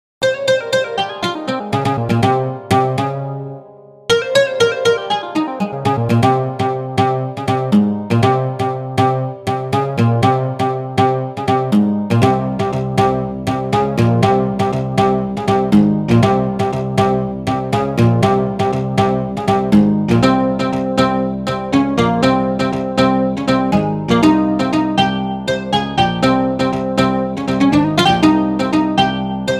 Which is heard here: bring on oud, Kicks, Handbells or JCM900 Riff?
bring on oud